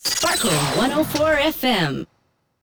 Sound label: Radio Funk Jingle; Gangstar West Coast Hustle; Android